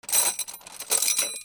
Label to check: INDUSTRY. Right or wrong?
Right